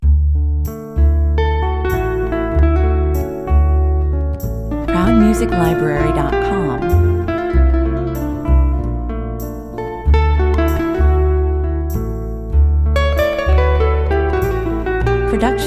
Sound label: my guitar 95 bpm